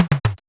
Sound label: Tom fill, Drumsynth Drums